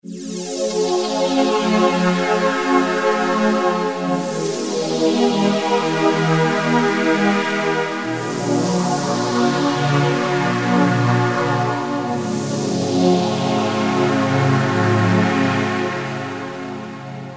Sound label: Silver Strings